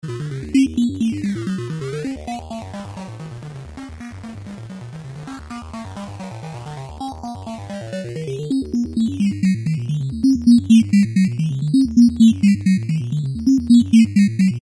vco

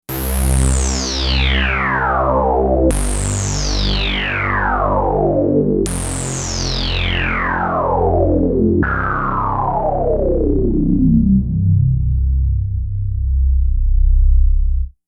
space doubt; obx8

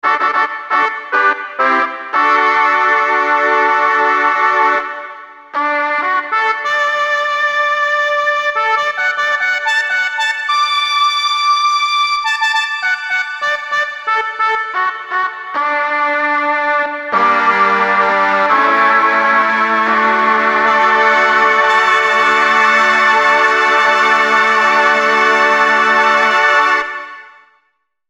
trumpet

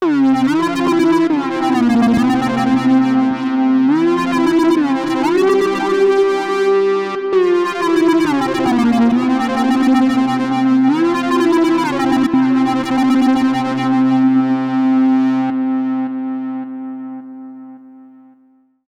SPEED WOBBLE

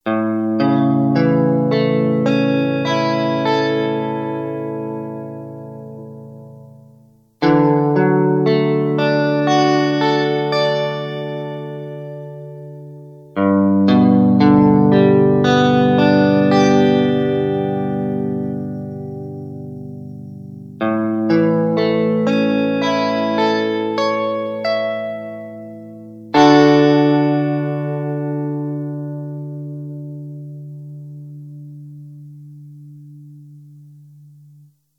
Electric Guitar

